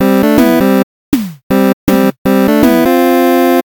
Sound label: level beat